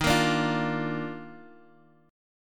Eb Major 7th Suspended 2nd Eb, Guitar, Half Step Down; EbM7sus2